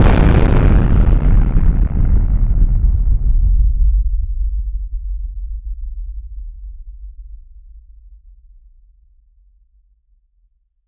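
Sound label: a nuclear bomb detonation; catastrophic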